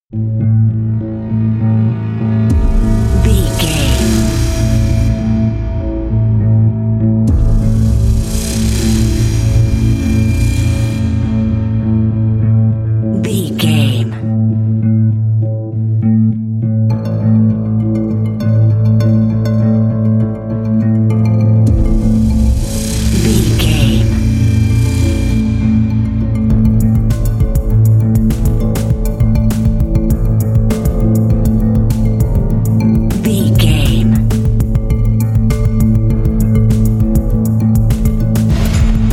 approaching storm

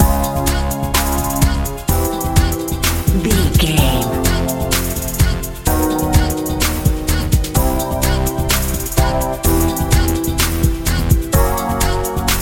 dance

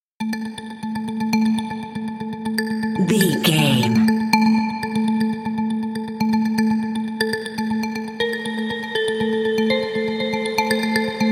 evidence crime narrative

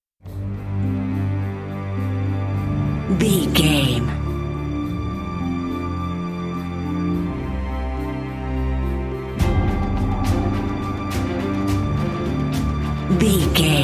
epic cinematic

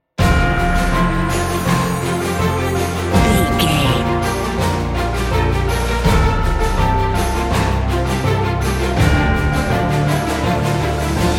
cinematic corporate

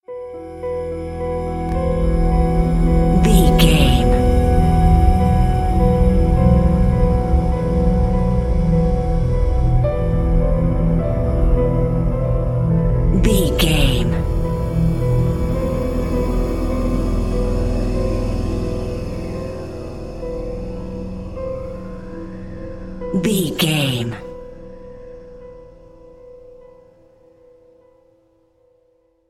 dark desolation

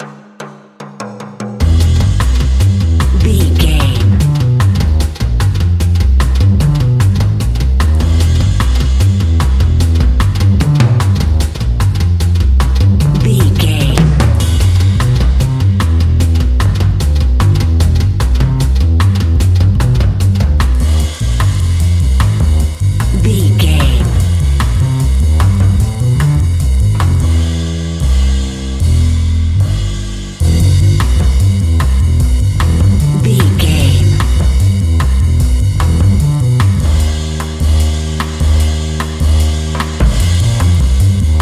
uptempo swing

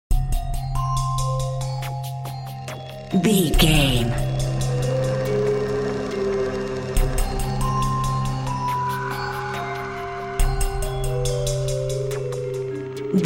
mysterious shadowy figure loop

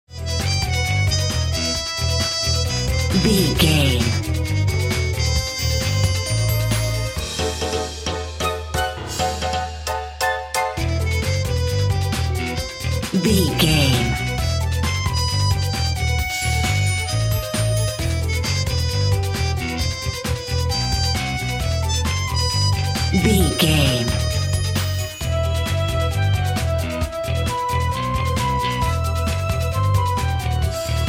vintage spy theme

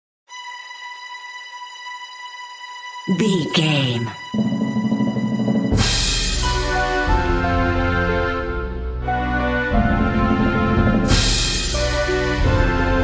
desert caravan narrative

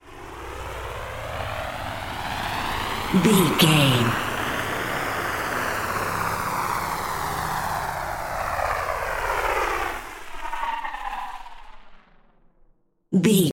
ominous war plane

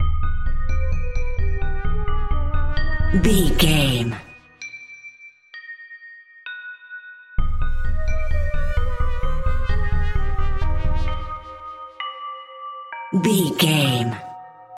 80s synth sci fi loop

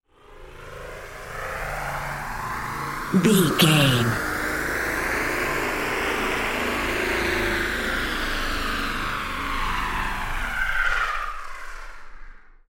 ominous war plane heard courtyard

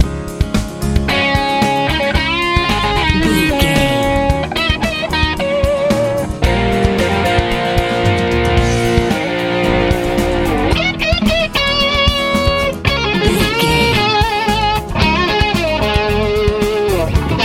easy rock

